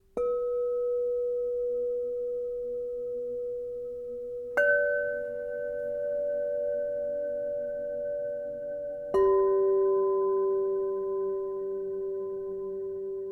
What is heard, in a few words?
Meditation Timer Crystal Bowl